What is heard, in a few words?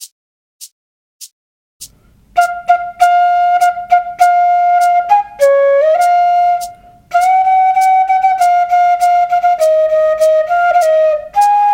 Jingle Bell flute; Bansuri Student Zone